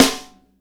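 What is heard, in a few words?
snare drum, acoustic set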